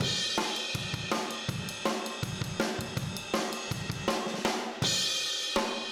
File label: Room M, Drums, 162 BPM; Take Your Toms Off